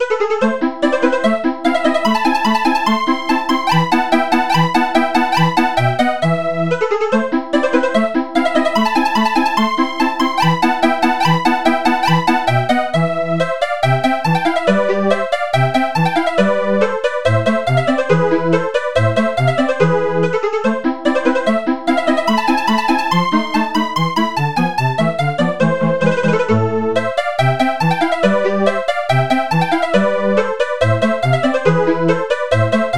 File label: calliope lead